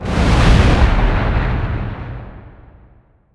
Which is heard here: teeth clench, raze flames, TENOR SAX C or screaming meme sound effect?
raze flames